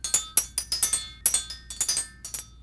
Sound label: chimes; Cars; XBOX; Ambient